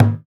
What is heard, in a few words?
African Perc